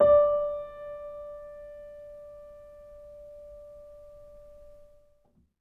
R, Acoustic Piano, PIANO STEREO; InVision Interactive; Miroslav Vitous

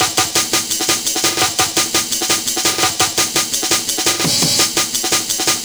Kickin Break, Drum & Bass; Beats & Breaks; Sonic Foundry; Fluid Dynamics Drum & Bass

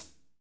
foot

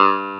clavinet G2; Keyboards Old School; USB Soundscan vol.09; AKAI; 90s sample CD; CLAVINET6

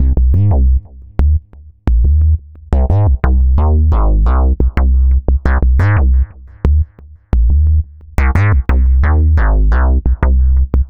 Synth Bass

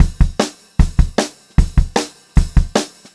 BT, Pop-Rock Drumloops; USB Soundscan vol.37; AKAI

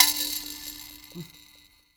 vib kalima

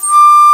Alto Flute p D4; Digital Sound Factory Virtuoso

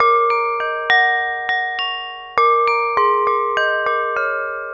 Corning Bowl; Melodic; Ambient Atmospheres & Rhythms; Ambient-Melodics-I

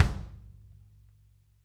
kick, drum kits

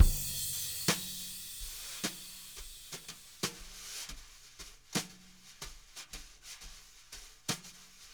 jazz brush loops

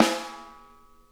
Interactive Drum Kits

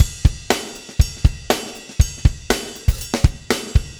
RIDE; Spectrasonics; Backbeat

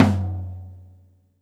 TOM, Drums Acoustic; USB Soundscan vol.10; AKAI; 90s sample CD

